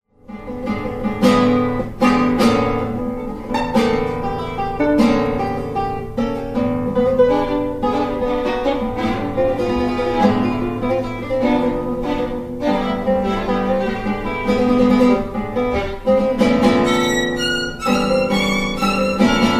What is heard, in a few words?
Double Concerto